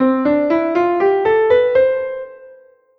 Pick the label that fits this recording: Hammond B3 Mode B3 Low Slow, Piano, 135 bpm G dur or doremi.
doremi